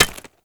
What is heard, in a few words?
Bone Effigy Impact Hit; Warframe; Tau; Destructible Dynamic